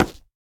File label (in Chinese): 下界木按钮点击声